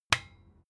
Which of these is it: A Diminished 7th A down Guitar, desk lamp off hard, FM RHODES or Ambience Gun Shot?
desk lamp off hard